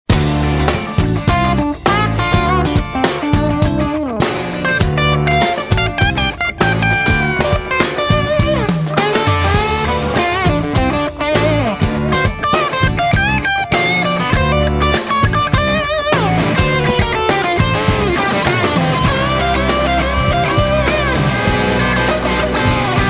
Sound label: jam track